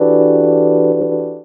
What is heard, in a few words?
Chords Tones; Equipped Music; Loungin House